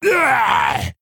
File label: Male Orc Death